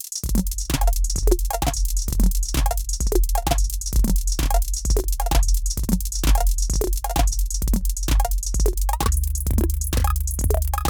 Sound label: Erica Synths Black Hole DSP2 Dual Pitch Shifter